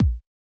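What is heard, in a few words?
Bass Drum; Yamaha DJX-2B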